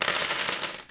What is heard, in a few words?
Domino Box, Board Games; George Karagioules; SFX for Board Games